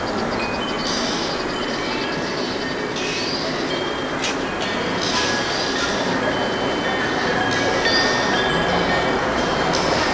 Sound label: Ambient